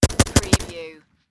Machine Gun HKG36

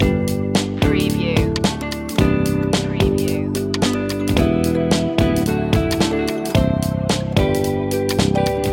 ocean life loop joiner; media music now

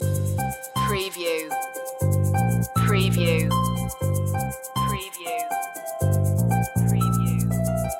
jumping jacks loop

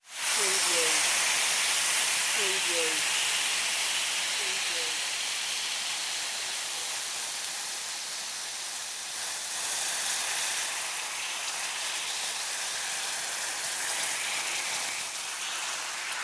Sizzle Frying Pan; sound effects bible

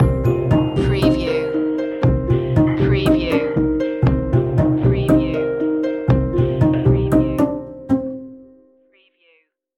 loop ending

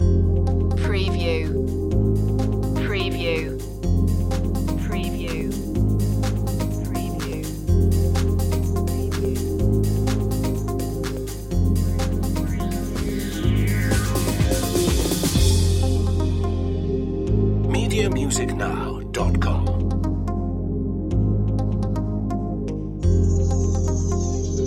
trip minimal loop

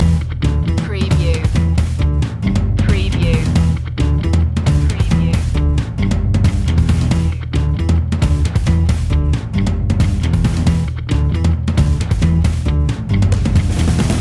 dorm room loop

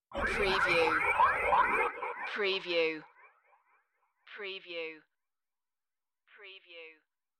Creepy

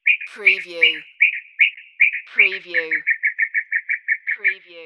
Mocking Bird 2 Calls

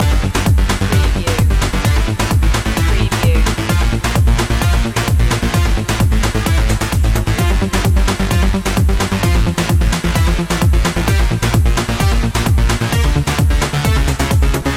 electro symphony loop

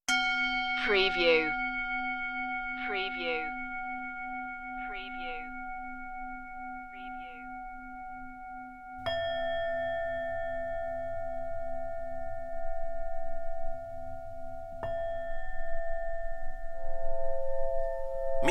tibetan bowls meditation

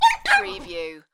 Small Dog Yelp

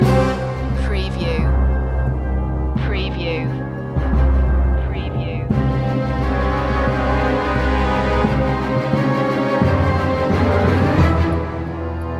orchestral action

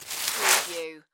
Sand Pail Dump Small; Sound Effects Bible

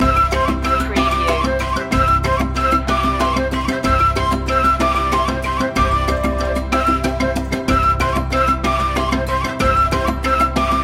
african spirit loop

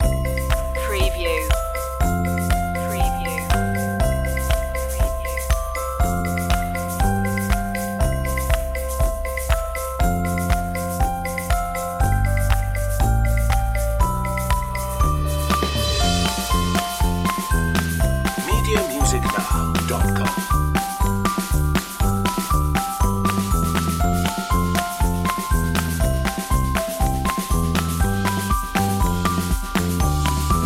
happy camper no acoustic guitar

